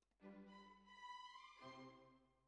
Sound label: Bassoon, Jazz for piano